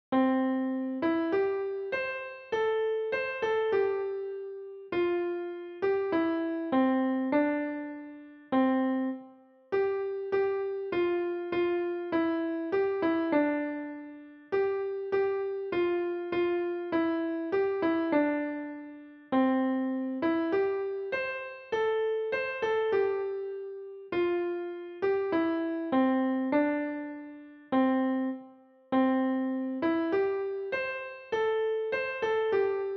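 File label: piano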